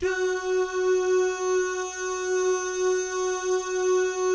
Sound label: "DOO" GUYS, Vocal Jazz Fx